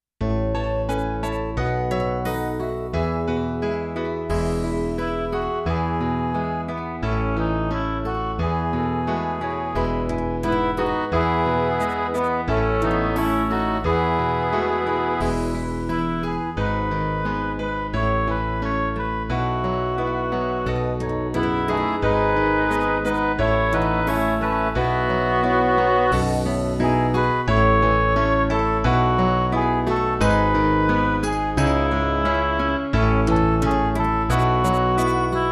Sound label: midi